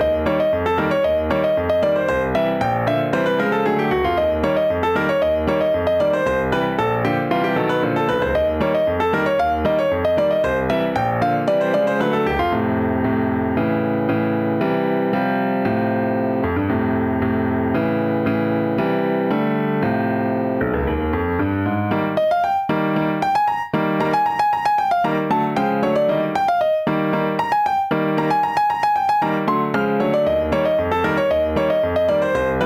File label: Silly Loop; Necrolepsy